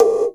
LO PERC GT

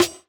Snare, JUNGLE BREAKS

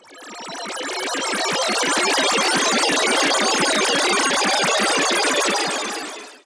Meltdown

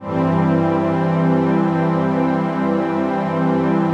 chord pad; Best Service; XXL 800 Pads; Sample CDs

